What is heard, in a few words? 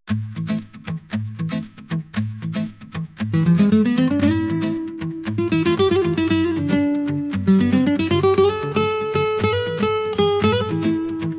Flamenco; baile espanol